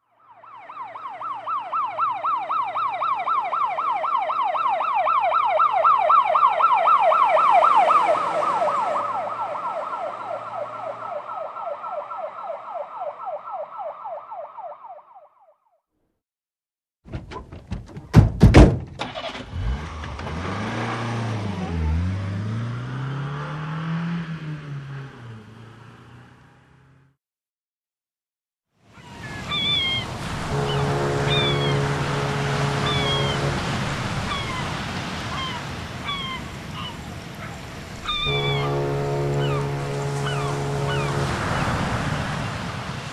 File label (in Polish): Efekty karetka pogotowia samochód osobowy statek samolot pociąg